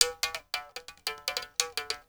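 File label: SM MOUTH BOW; Spectrasonics; Heart of Africa 2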